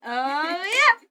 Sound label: Female Vocal Crescendo